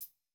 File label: hihat